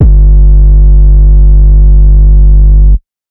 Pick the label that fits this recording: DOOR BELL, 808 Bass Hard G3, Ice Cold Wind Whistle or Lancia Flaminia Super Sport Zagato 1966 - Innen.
808 Bass Hard G3